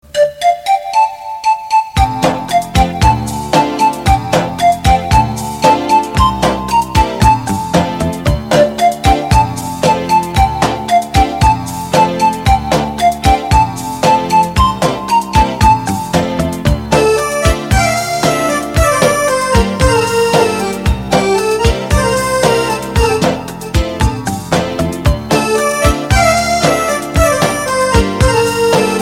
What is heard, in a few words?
Music